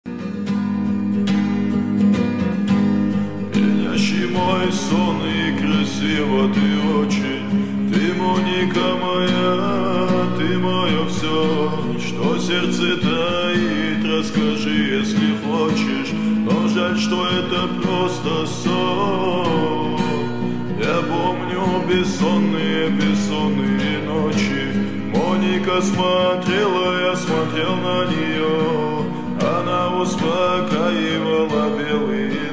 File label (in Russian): Гитара версия